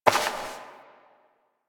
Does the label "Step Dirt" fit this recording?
Yes